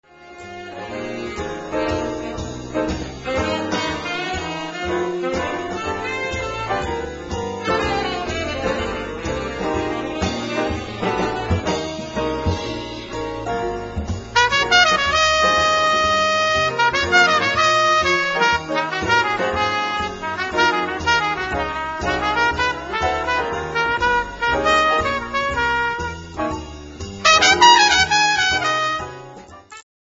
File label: Viable Blues